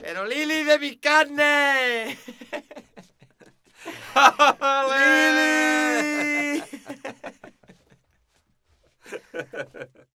Flamenco, Vocals, Male

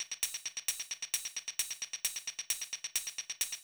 Beat, Old Skool House, 132bpm

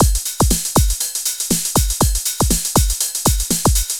LOOP120; Sounds Good - Eurotech

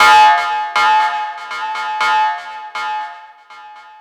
SFX, Trance NRG

